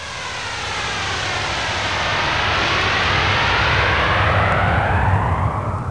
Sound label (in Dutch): Straal; Sound Effects Deel 1